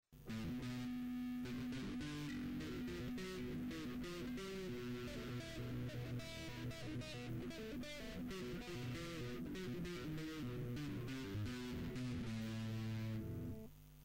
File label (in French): Gamme de LA majeur, Guitare